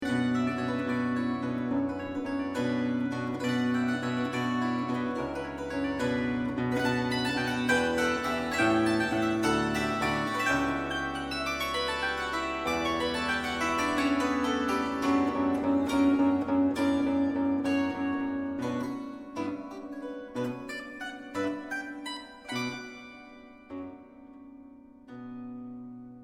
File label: impromp